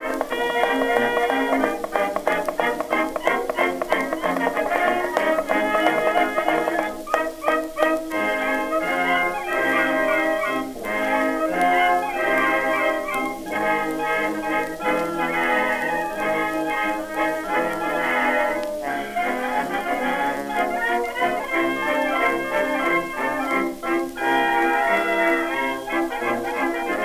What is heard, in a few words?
Chinese blues, jukebox blues